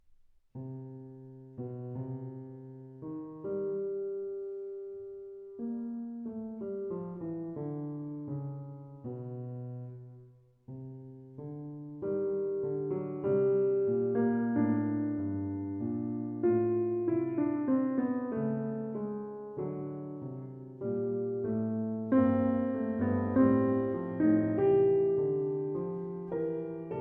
Fugue in C Minor, Alternative Four-Voices Exposition; Completed by A. Simonetto